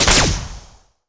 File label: alien rifle fire